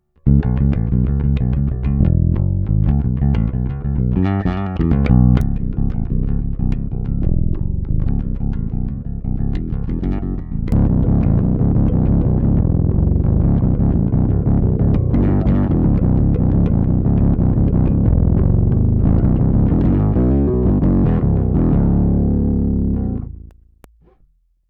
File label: RW Factotum Fingerstyle